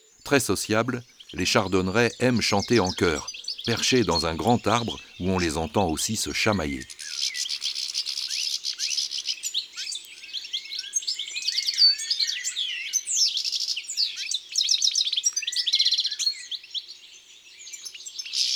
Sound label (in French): Chardonneret elegant